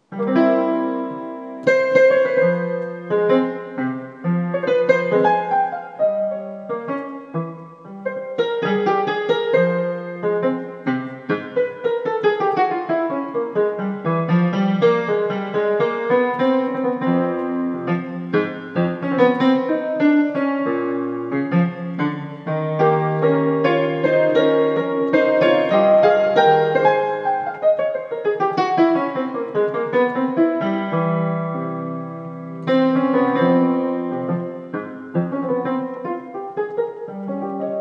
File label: tango chromatique